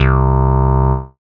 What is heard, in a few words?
bass C, Acid Bass, Dance Synths